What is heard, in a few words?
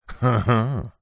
human male laugh huh hmm